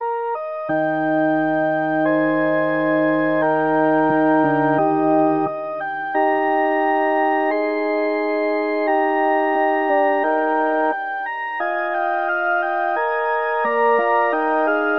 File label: mystic organ